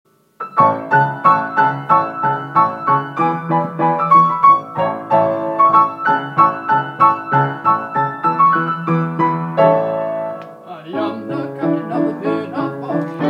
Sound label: chorus